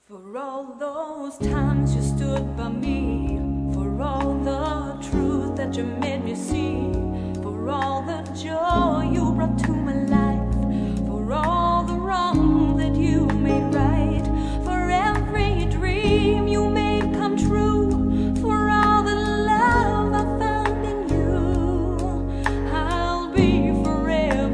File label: vocals